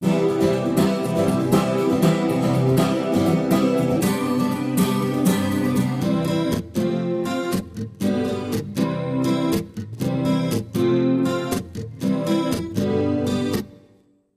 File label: Chorus + reverb